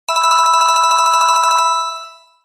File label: Alarm Clock; Coolpad